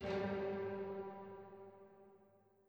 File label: chime old; chime sharp